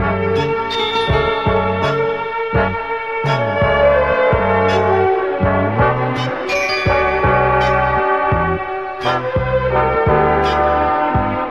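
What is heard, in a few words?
strings and guitar; Loops; Phonky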